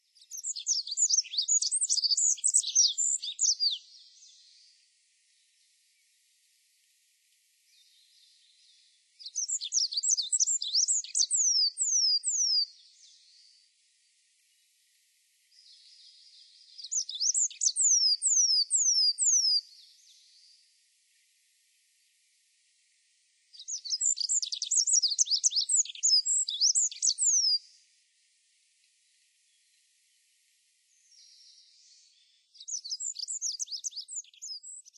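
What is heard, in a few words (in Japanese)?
binzui, olive-backed pipit; asyoro; koe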